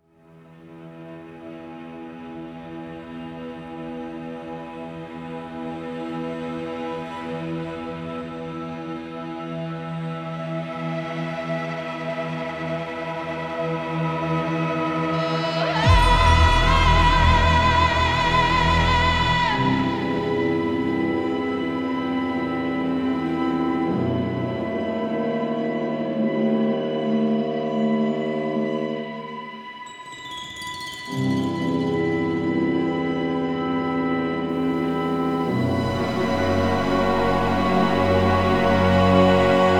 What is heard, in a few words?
Creepy Forest 90BPM Intro; Music